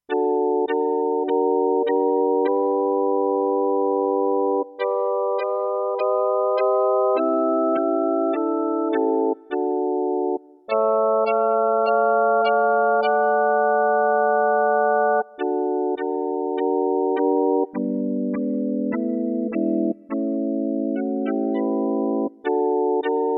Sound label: augmented chord progression